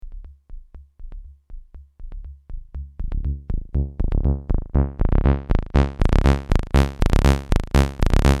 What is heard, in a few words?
saw 24; emu workshop